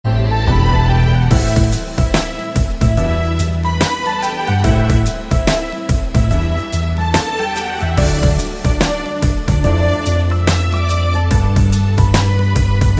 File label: New Instrumentl